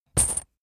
metal chain impact

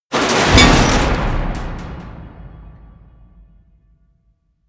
metal crash; hits explosionen; metall

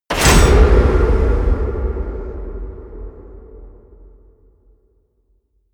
hit metal

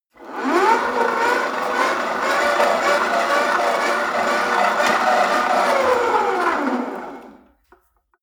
rope trainer pull sequence fast long; sport freizeit; fitnessstudio

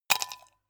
ice cubes glass; gastronomie; berufe

